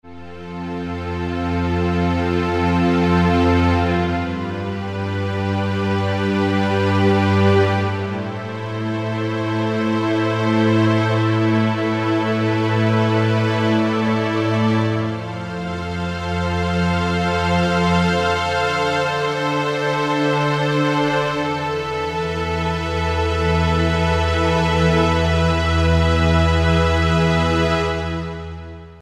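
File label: Legato Strings, Yamaha QY70; XG Voice examples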